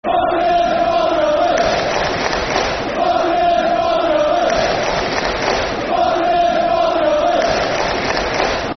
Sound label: Barmy Army [Football Chant (Soccer Song)]; Swansea City Scfc; Martinez Barmy Army